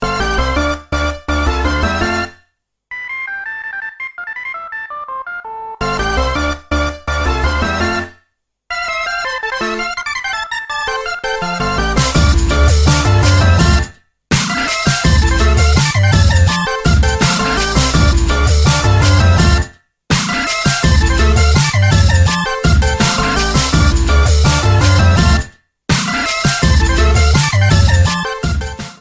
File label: nokia ringtone